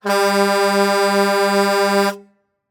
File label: Semi Truck Horn; Large Vehicles; Blastwave